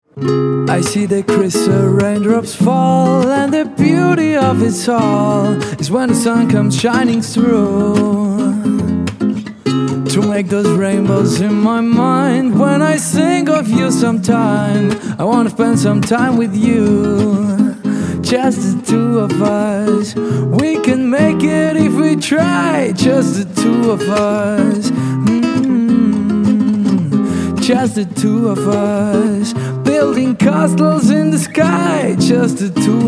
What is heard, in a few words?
R&B SOUL (with guitar)